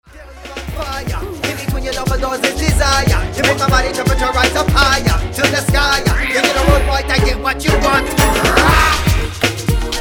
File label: dancehall